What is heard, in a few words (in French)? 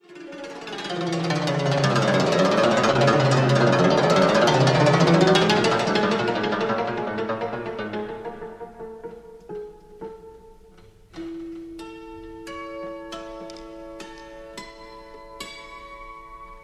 Hora lautareasca, Roumanie